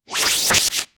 looking through the cards, card game

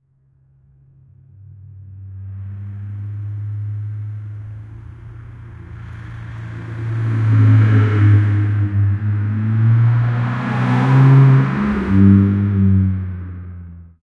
crow car car car sound, bird

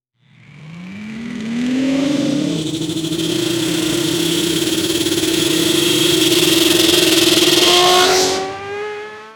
futuristic motorcycle

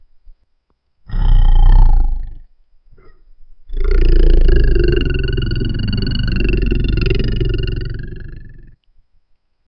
monster tackle a prey

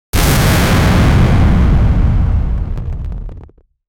superhero sonic boom

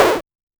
2d pixel art game sound

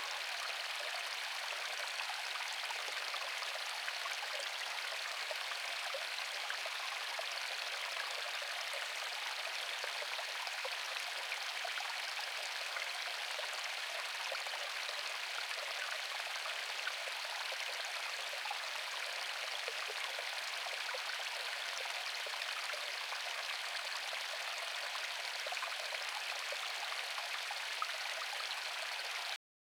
water

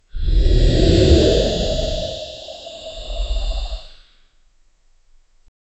giant spider demon roar; portal

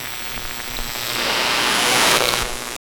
the sound of a radio, everyday objects

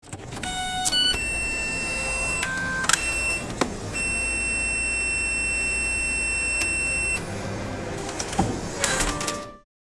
scanner beeping and cash, retail

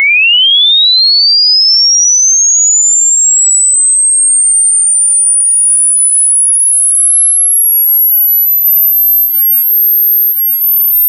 synth

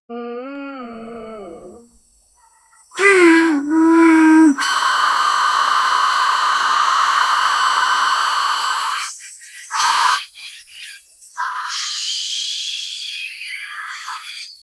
generate an audio of diar, body

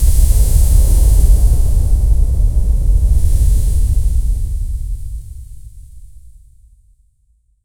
dense smoke burst sound; textures

